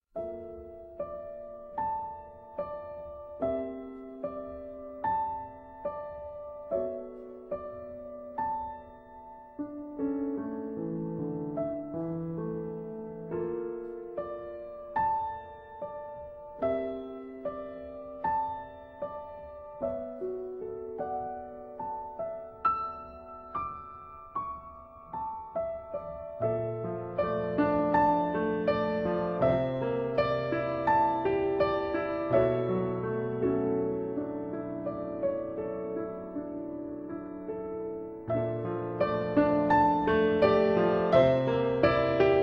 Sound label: Piano Collections